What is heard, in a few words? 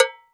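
Cow Bell Medium, E-MU Orbit-3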